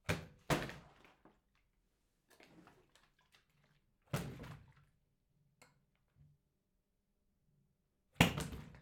Big Full Water Tank Falling

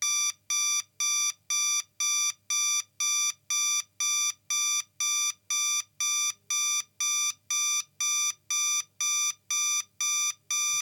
Alarm Clock, Philips; annoying

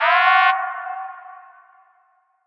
Klaxon

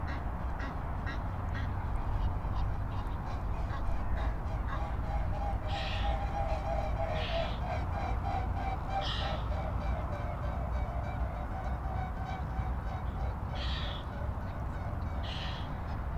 Swans Fly By

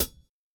Industry hihats

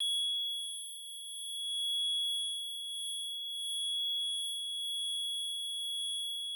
High Pitched Ringing

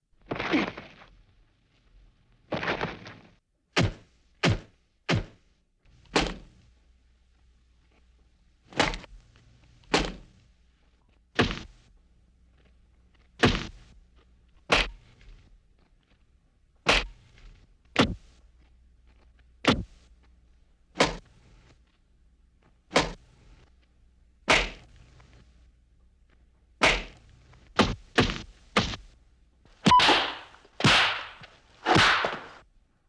Cartoon Like Karate Effects

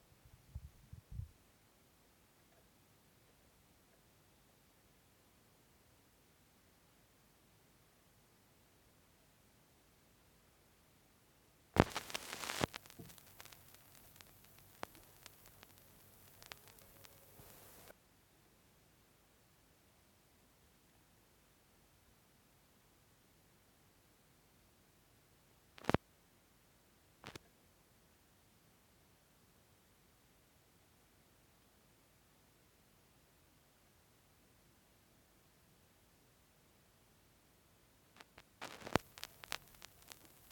Recorded Vinyl Noises

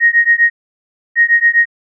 Beep x4

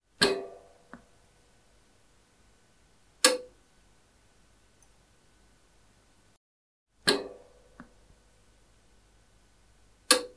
Crt Tv Switches On

